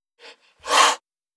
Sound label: Cobra, Indian Hiss. Close Perspective.; snakes